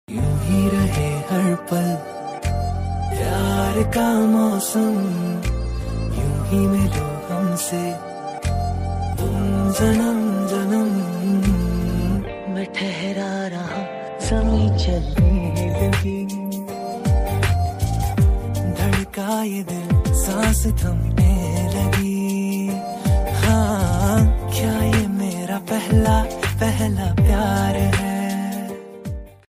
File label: unplugged Ringtone